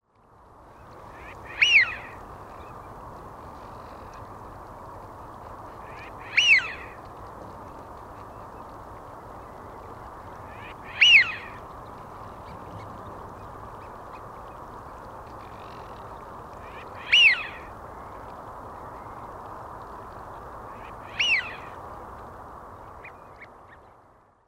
wigeon

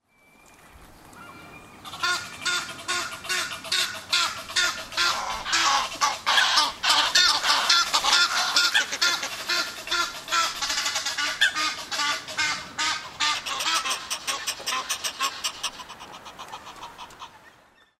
egyptian goose

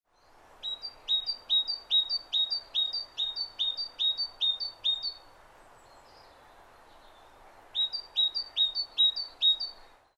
great tit

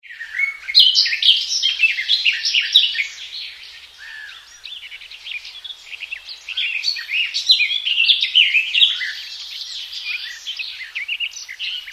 barred warbler

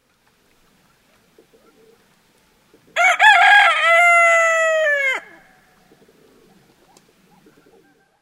cockerel

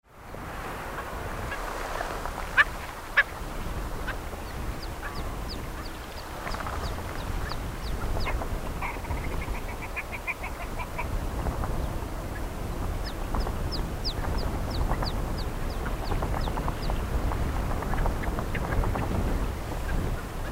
red grouse

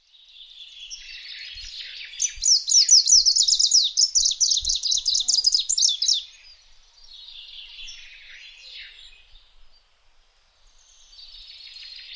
greenish warbler

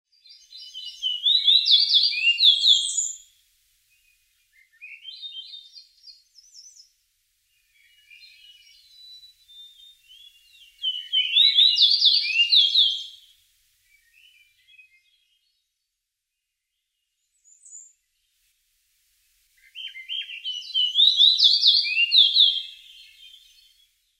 fox sparrow

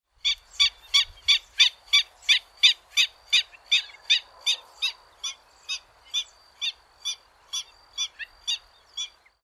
black winged stilt

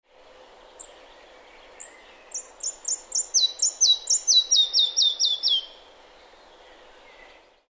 red breasted flycatcher

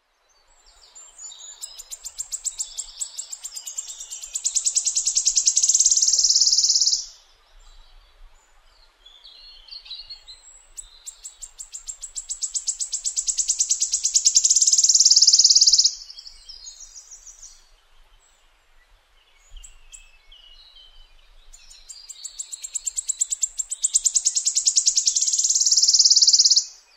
wood warbler

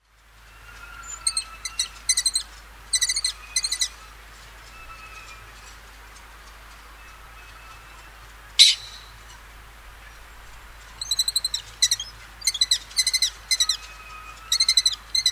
lesser kestrel

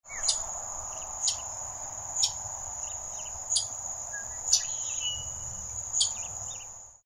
prairie warbler

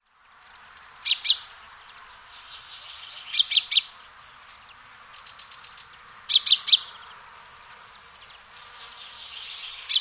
african chaffinch

